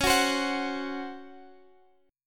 Db Major 7th Suspended 4th, Guitar, Half Step Down